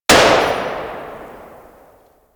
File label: Sicarus Tail 2D, weapons; DE; Warframe